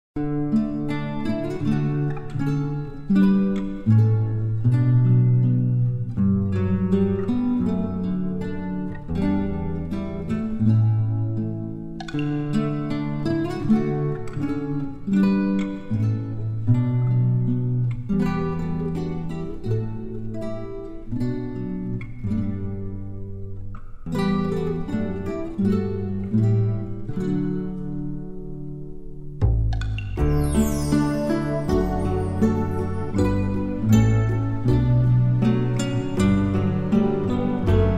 LITE EASY, Royalty Free Music; podcast production toolkit

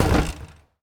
Street Ball Glass Basket

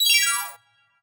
Pickup Gem Bells; SFX Kit; Pickups

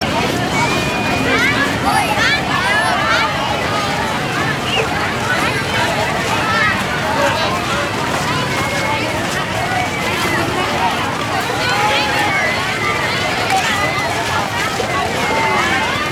school out children; best service Blue Box

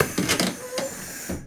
bus window; best service Blue Box

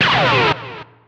Pickup Guitar Scrape; SFX Kit; Pickups

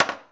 Pinball Ball Drop

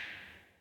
school hall, impulses; NS Studios - ultra sound collection

